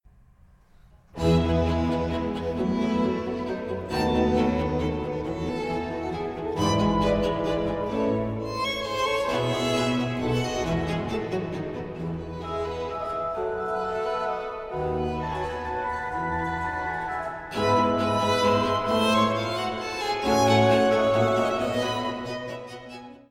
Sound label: F major for 2 violins and basso continuo and organ obbligato Allegro